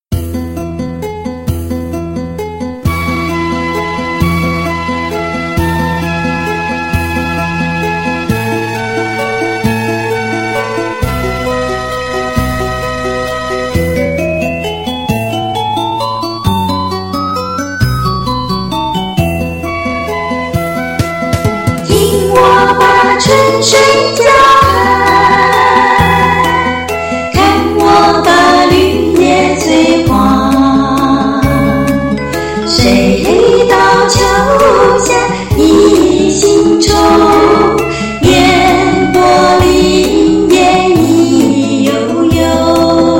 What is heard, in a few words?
Autumn Cicada